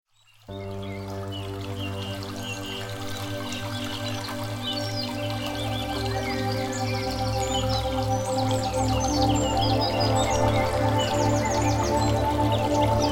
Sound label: Deep Delta Sleep Meditation